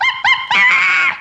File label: Monkey